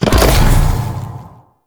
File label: Door Open; Warframe; Sentient Devourer; Ambience